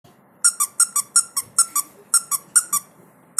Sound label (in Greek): ήχος squaeker στρογυλό